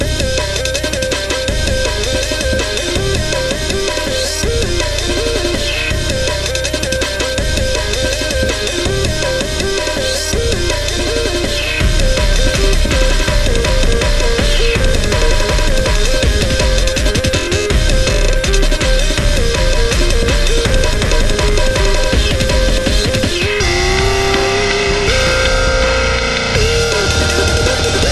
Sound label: loop